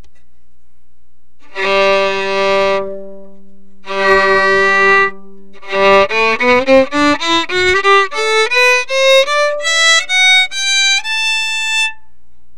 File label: acoustic vs unamplified electric